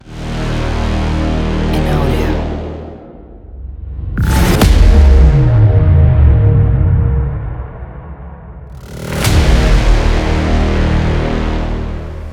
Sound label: action trailer